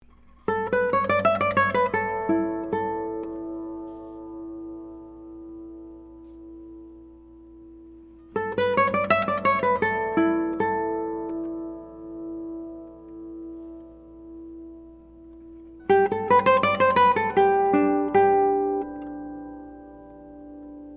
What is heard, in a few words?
Resonance; Sakurai; Resonance Test (Stopped scales / chords showing sympathetic / resonance); Sony PCM D1 flash recorder using the built-in microphones